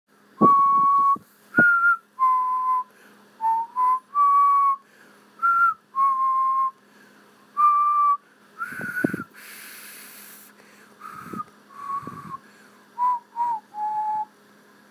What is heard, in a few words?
I can't whistle